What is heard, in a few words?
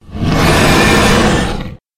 monster wyvern white